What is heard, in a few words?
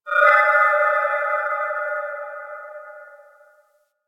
Moon Chimes Medium D, Ambience; Warframe; Orokin